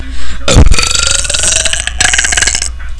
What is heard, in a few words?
burp